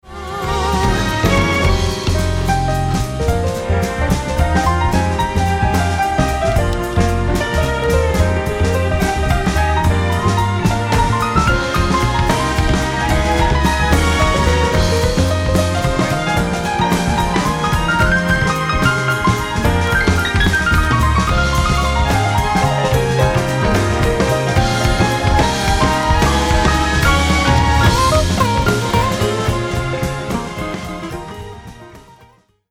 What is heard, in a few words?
Vol Automation Piano Auto Off